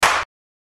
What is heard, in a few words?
Dance Clap